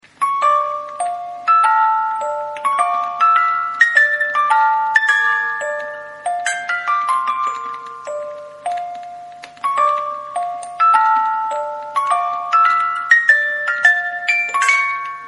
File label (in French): 18 lames, boite musique manivelles